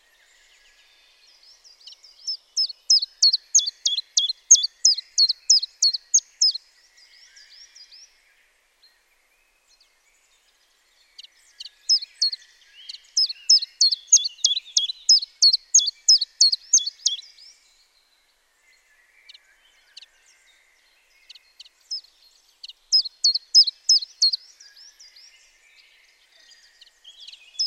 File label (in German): Zilpzalp Gesang